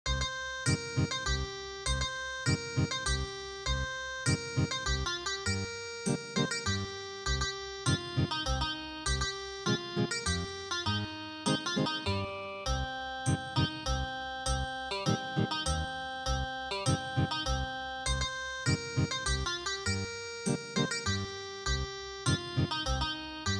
arirang Ethnic Guitar; traditional

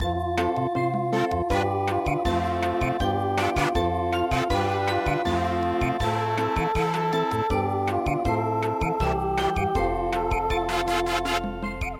Samba

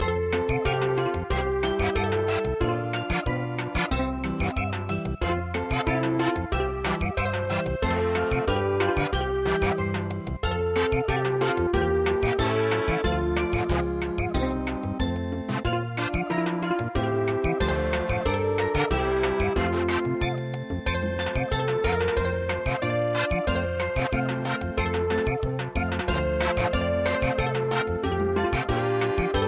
Samba, traditional

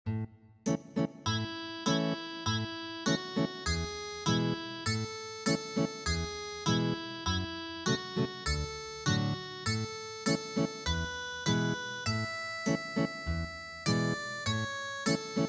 Ethnic Guitar

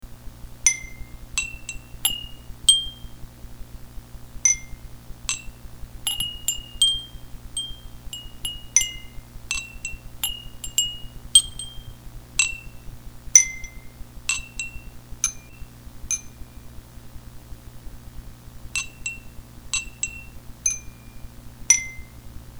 wind bell

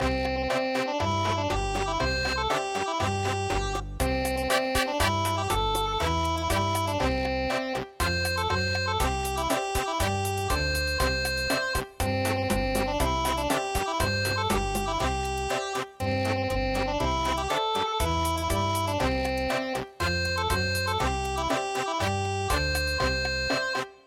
chachacha

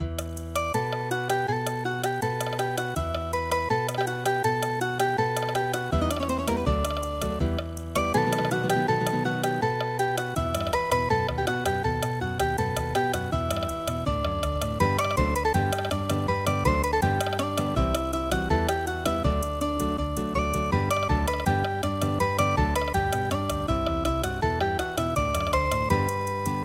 flamenco

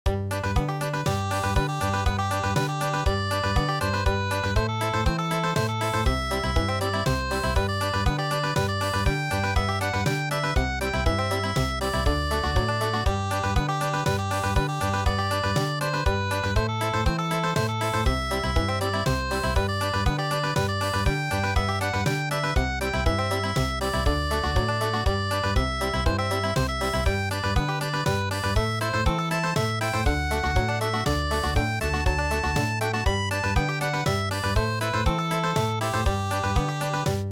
Country, traditional; East India Nightingale

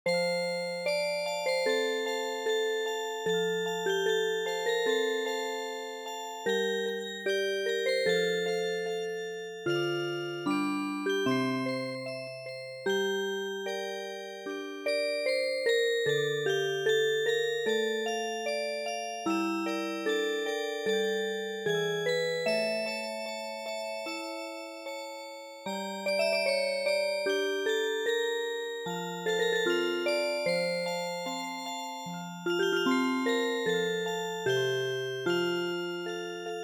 chanson du toreador Music Box

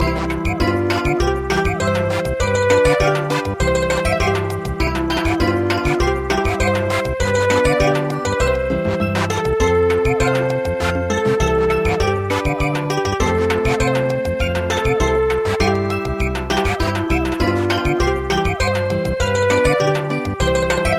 Samba

